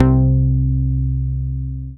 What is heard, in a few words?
Dance Floor Bass, Multisample Basses